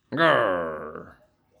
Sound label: Angry Growl